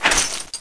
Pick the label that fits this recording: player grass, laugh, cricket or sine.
player grass